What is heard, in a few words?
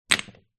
herb spice jar small down next to others on table